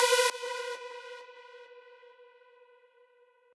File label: lead